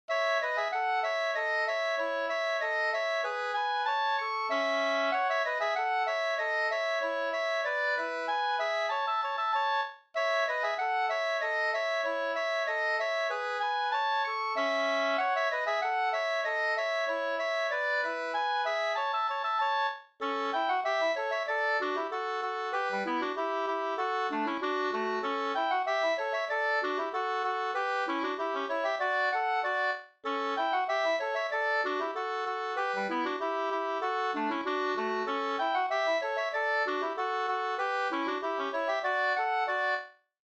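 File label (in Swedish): anglas 2 stämmor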